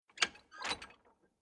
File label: Squeaky Rattling Metal Movements Creaking Rickety Single; Machinery Sound Effects